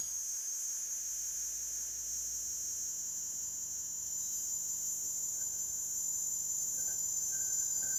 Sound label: Australian cicadas